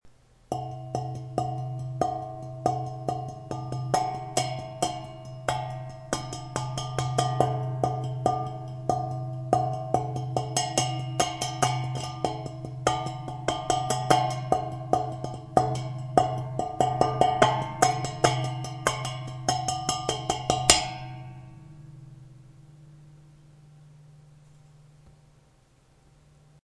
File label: metal perc